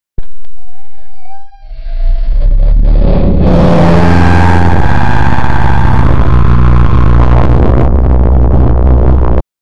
glint completion sound, notification

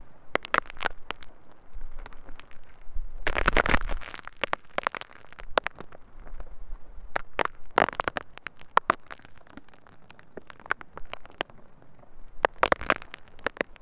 heavy deep rock cracks; geological

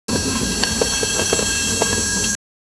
the sound of hot iron, metalworking

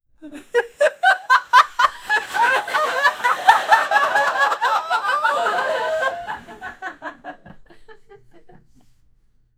two people laughing; voices

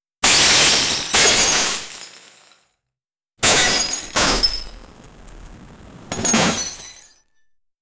glass shatters biotech, breaking

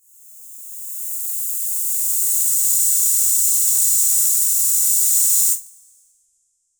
short soft air release

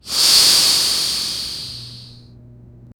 gentle whoosh of cold air

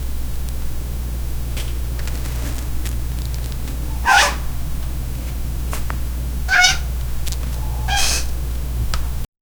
the cat gently pushes the

